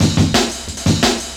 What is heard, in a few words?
175, Breaks; Drum&Bass; EZ Rollers